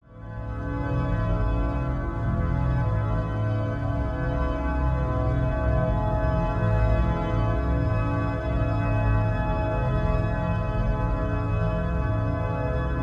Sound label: sustained rem organ